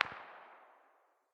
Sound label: pistol very far distance; 45acp; tacz-tweak-pack; taczmechanics